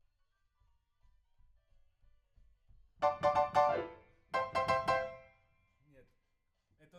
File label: PIANO, RNR for piano